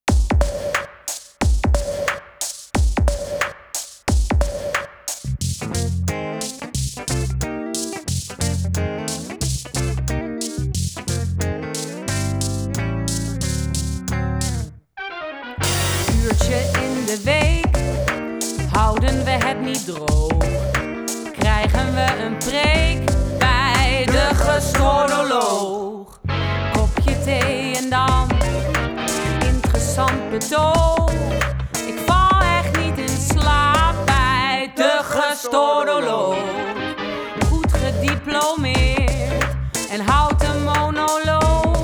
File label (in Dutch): muziek; gestoordoloog